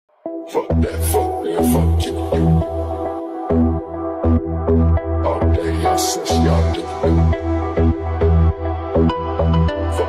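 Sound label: bass boosted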